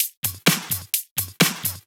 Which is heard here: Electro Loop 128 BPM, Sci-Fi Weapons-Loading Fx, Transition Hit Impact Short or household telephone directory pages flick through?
Electro Loop 128 BPM